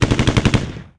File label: Gyrocopter impact hit